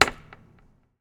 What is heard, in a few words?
jump